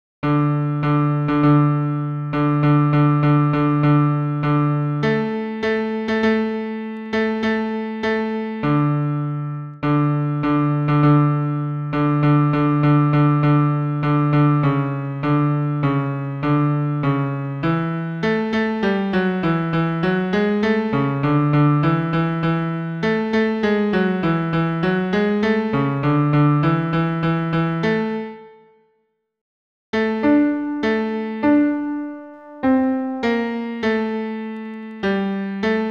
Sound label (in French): Chanson des trois cousines bas pian seul